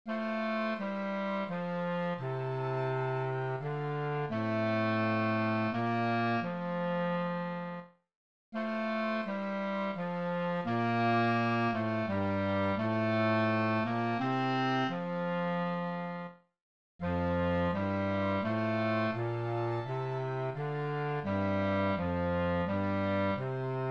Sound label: tuba Bb